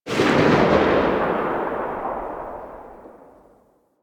Sniper Alt Fire Tail; DE; Warframe; Frumentarius; Exalted Sniper

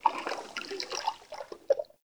Under City Cavernous Water Lap Rock

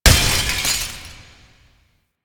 Crystal Damage; Warframe; Veilbreaker; Devourer Kahl

